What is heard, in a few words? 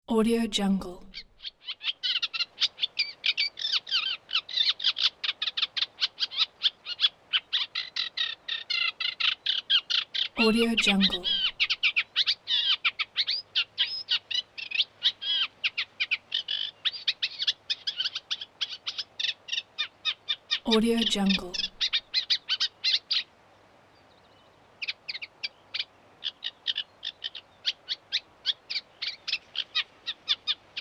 chirping bird; alexleadsounds